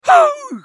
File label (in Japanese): 攻撃; パンファルロ